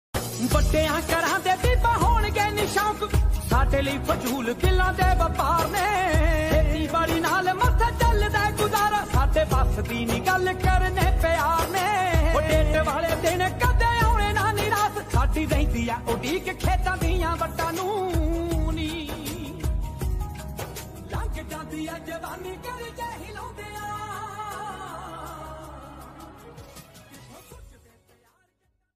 DJ Punjabi Ringtone

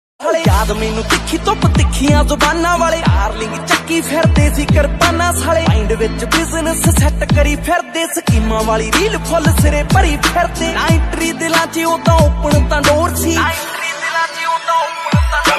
Punjabi Ringtone

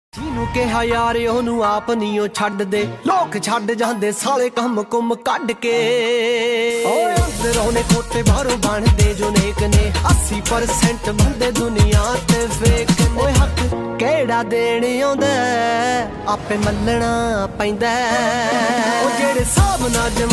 Pendu Punjabi Ringtone

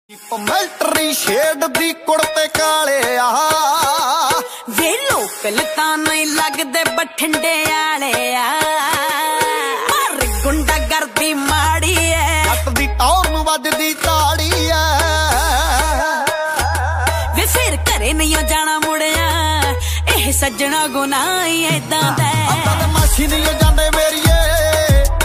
DJ Punjabi Ringtone

